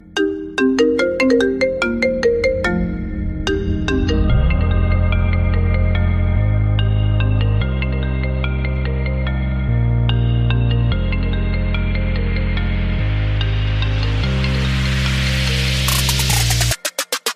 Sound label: IPhone 8-8plus